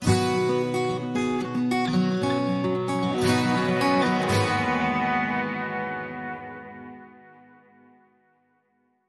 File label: Sting 2 (Ambient Ver)